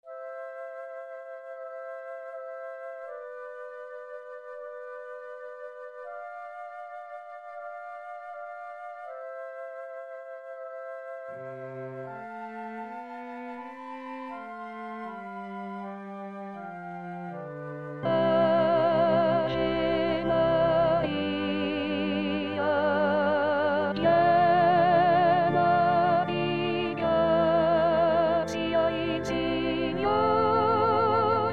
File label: avemaria soprano, vox; 2016 vox maria